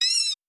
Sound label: Squeak Thing; Ed Edd n Eddy; Adromulus Pack; EEnE